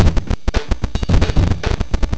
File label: drums